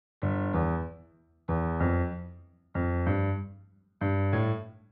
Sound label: bass